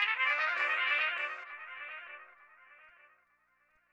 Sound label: Stab; Thirty-One Flavors